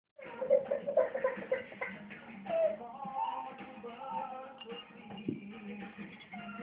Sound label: baby hungry